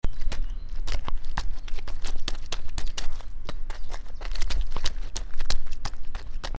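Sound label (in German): matsch mit füssen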